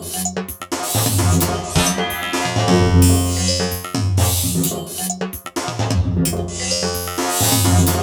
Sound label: 120 NEON FX 1, Industrial Loops